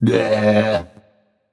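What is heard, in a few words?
tongue, non-verbal; smite; voicepack; merlin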